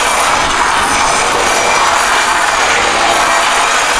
grind metal; terrains; Tony Hawk's Project 8; XBOX

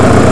midsize eng; Chase Hollywood Stunt Driver; XBOX

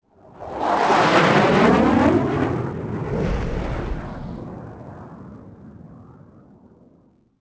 f16 flyby; Soldier Of Fortune II Double Helix; XBOX

